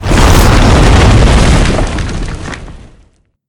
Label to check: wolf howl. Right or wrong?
Wrong